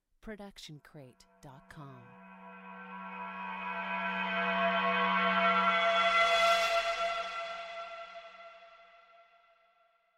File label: Metallic Magic Movement; SoundsCrate